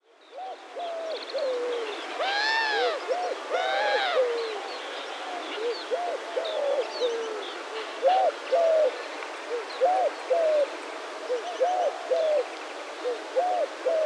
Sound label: dove burmese collared streptopelia xanthocycla callsong; kyaunggyiama; baga